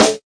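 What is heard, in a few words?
snare, Classic Hip Hop; STYLE KITS; Classic Hip Hop Mega Kit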